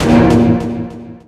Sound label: Lose; blackshades